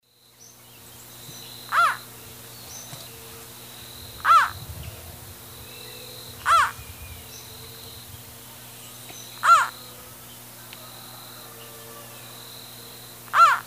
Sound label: Thamnophilus doliatus, calls male; S. America; Eastern Lowlands